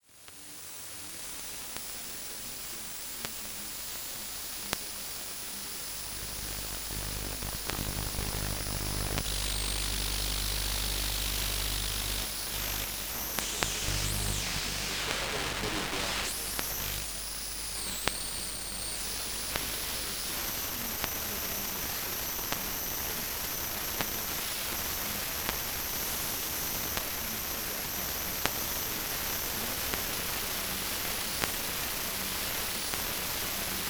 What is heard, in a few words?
Radio Tower, Static, Interference, Electromagnetic; ELECEmf; Electromagnetic Design Vol 2